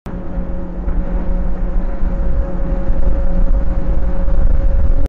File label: ferris wheel; چرخ و فلک